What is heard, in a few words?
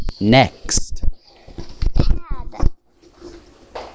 pad